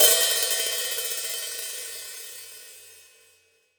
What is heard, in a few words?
Real Drum Kit, JUNGLE KIT